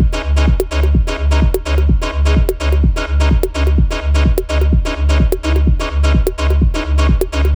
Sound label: Neon 127bpm, House Drumloops; 90 s Sample CDs; Zero-G House Fabrik Drumloops; ZG Drumloops